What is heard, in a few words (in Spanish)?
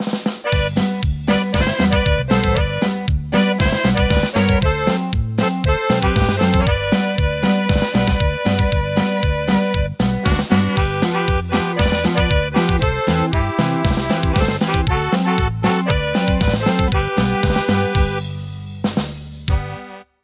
Corrido